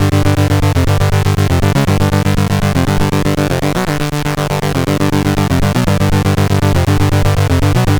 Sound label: C64 Bass, Commodore 64, Chiptune